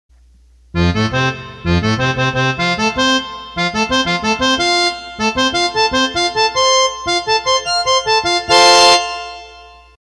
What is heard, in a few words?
Accordion